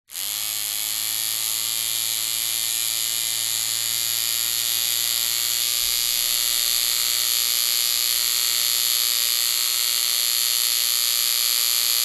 household facial hair trimmer with long hair attachment run short no cutting